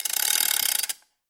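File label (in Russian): zvuki tsepei, chain sounds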